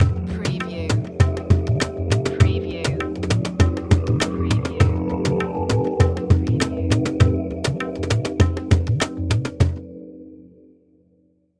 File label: Musical